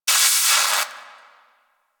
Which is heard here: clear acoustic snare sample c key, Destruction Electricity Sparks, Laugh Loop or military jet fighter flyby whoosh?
Destruction Electricity Sparks